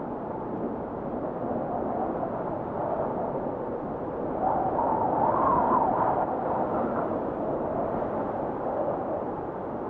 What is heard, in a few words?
fs Wind Loop; jack and beanstalk